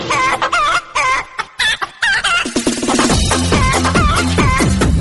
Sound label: chicken song